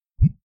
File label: Bloop; Astroneer